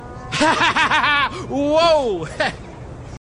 hahahahaha; animals

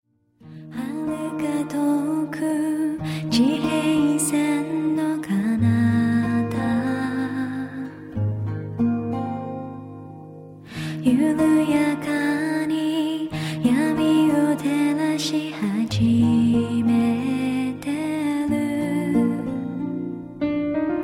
horizon melody

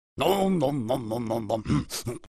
omnomnomnomnomnom; animals

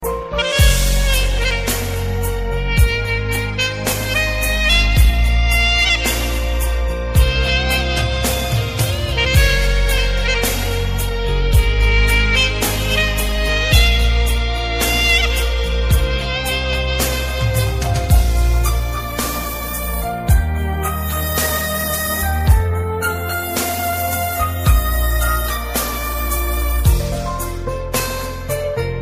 sax; master piece; christian

